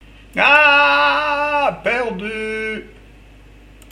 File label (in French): Horreur